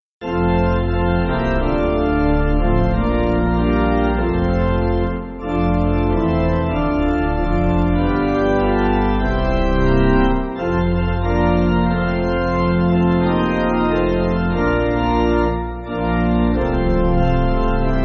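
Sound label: Pipe Organ, church backing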